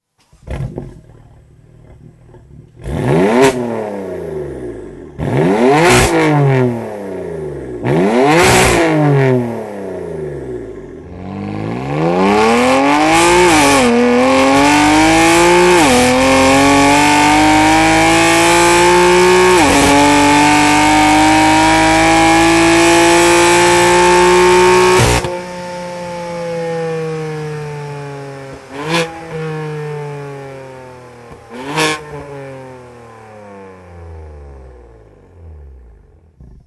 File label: Downpipe BMW M4